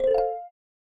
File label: message